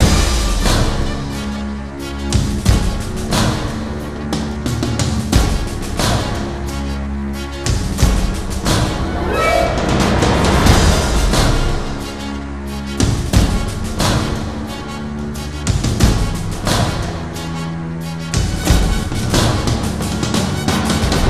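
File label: music, drum trumpet loop; transformers war for cybertron gamerip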